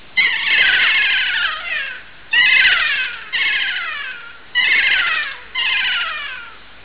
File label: kea